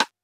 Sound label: Rim, drumkits 707